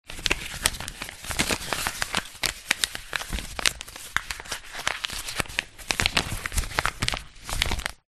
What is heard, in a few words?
paper sheet folding multiple; foley